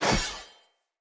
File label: Short Sword Whoosh D; Weapons; Enemy; Swords; SinecureShortSword